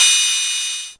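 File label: Yamaha RS7000 hi-hat cymbal Gb2; drum machine; Yamaha RS7000 Cymbals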